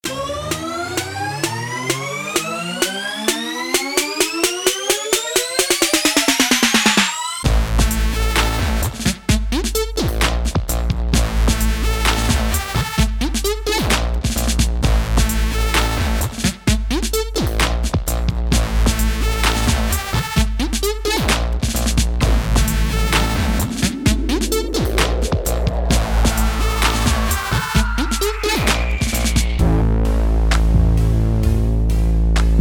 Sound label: dubsteptrap mix